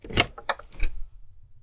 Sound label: win; Untitled Maze Game